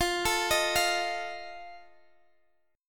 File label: F 7th F